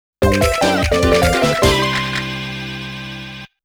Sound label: Final Round Clear MUSIC; Namco Classic Collection Vol.2; arcade